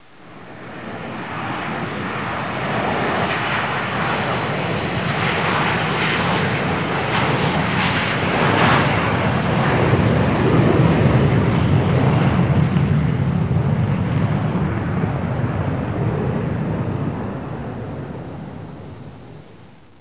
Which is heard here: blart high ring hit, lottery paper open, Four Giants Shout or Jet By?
Jet By